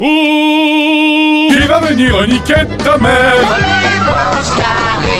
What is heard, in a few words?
woooooo